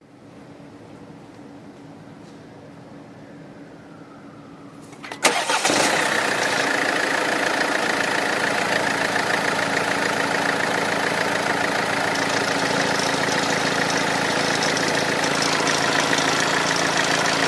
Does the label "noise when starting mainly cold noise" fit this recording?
Yes